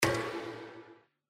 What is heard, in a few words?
BTN Bonus Spin, slots; Panda Majesty2